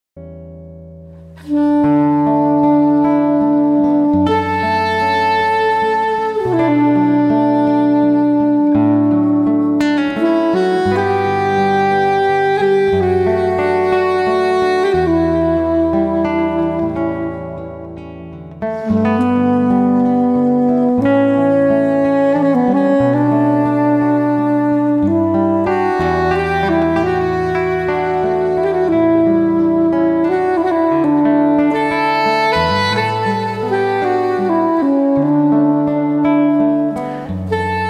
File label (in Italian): sax sopr. chitarra